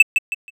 cursor

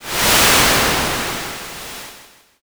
wind

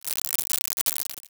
applause

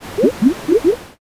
poison

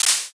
clap

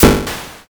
thunder magic

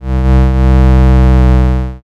creak

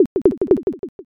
slot stop

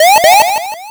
warning

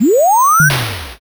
warp jump

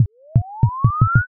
pulse

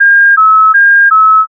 ambulance

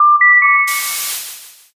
modem

